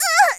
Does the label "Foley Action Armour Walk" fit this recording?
No